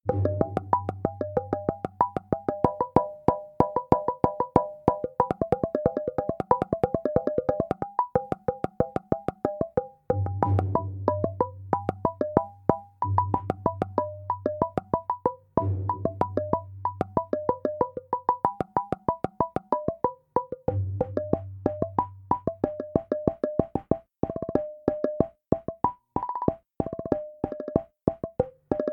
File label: Bright Interlude Perky